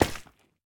树脂落地声

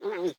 犰狳环境声

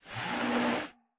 嗅探兽嗅闻声

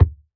泥坯 踩踏声

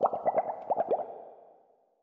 气泡柱上升环境声; ambient

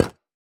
饰纹陶罐踩踏声

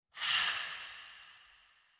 灵魂疾行声

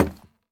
竹木悬挂告示牌踩踏声

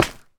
蛙明灯踩踏声

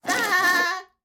山羊尖叫挤奶声

